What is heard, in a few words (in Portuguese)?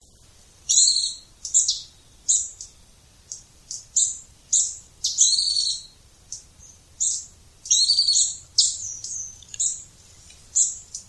Canto do estalinho